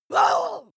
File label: witch shout short